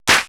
prc a2, Procussion Mix; Retro Sampler; EMU E-III

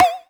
Percs, Elektron MachineDrum SPS1 MKII

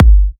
G, Kicks; markus hakala

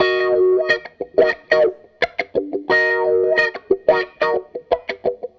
Strat Guitar Loop

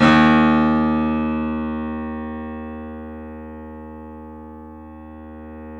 The Piano D#; InVision Interactive - The Piano

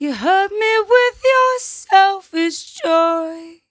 57bpm Am, Velvet Vocal; Zero-G

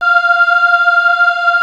C 605 CHOR, Yamaha C605; TECHNO-BWARE

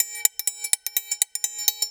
Percussion Loops, Brazilian; Houseworx

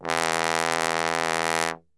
E 1 BASS TRB, Brass; Best Service; Brass Super Section

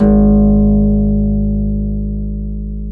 HARP G#1; AKAI S-Series CD-ROM Sound Library VOL-2

